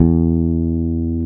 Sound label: Basses, Roland